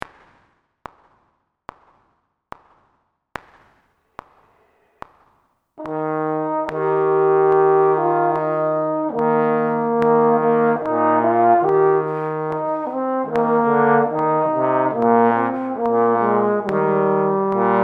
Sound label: Maj E flat, trombone; The Tone Ladder